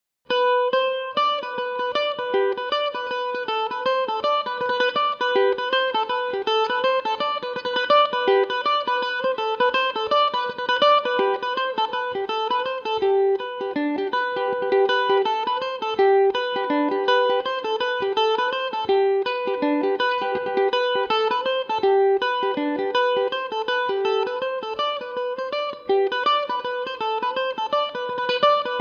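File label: G major, Irish mandolin; The Blacksmith; Epiphone Mandobird VIII electric mandolin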